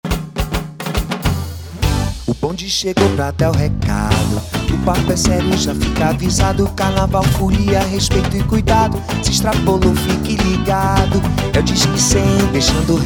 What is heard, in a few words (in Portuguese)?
jingle; blocodisque100 mdhc